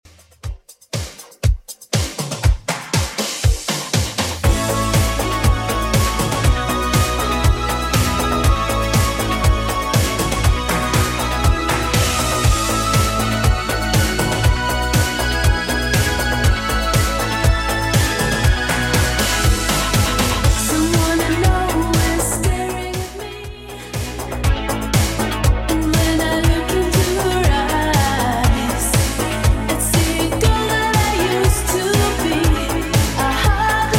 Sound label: 80s Redrum 120bpm